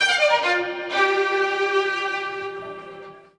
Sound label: STRG; USB Soundscan vol.32; Roots Disco; AKAI